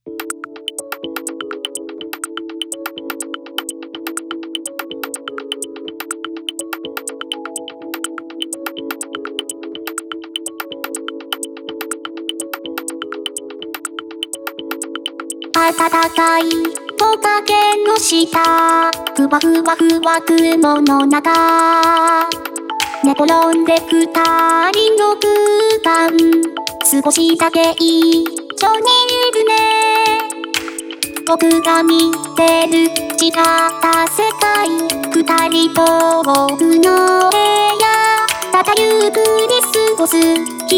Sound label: Humming; Vocaloid; Hatsune Miku